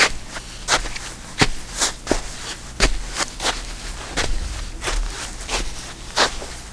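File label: SAND, FOOTSTEP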